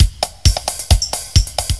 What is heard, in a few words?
loop; Descent